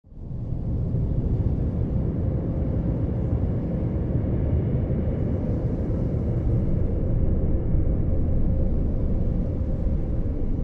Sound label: air transition